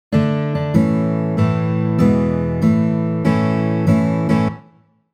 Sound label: happy birthday, guitar